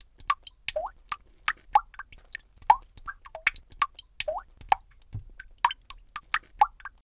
Rain

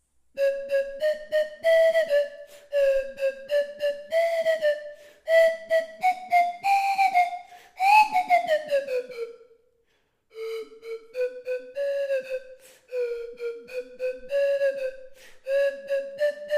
SUGRU SLIDE WHISTLE

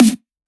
Snare, Air Drums; DIY Arduino Air Drums

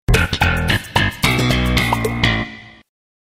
Sitcom Bass Riff

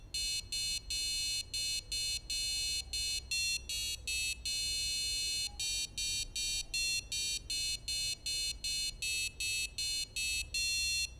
jingle bells; Arduino Christmas Cheer

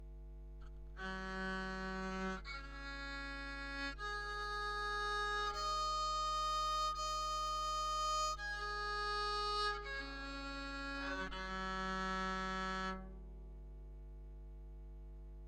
Violin, Electric Violin

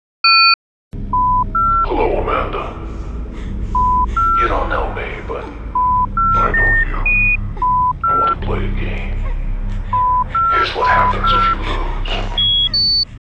Saw

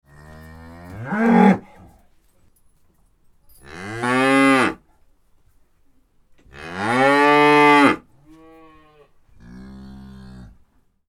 Cow moo sounds

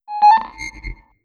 blip Close; Wall Ping Sensor

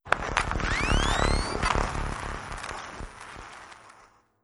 startup; Wall Ping Sensor

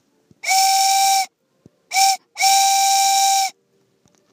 Laser Cut Train Whistle; No-Glue

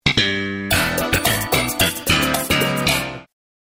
Sitcom Bass Riff

